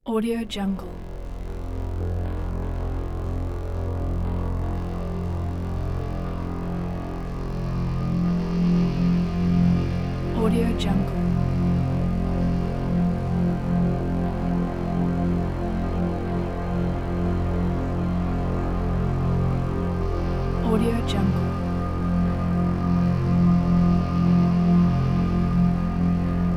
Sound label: horror ambient background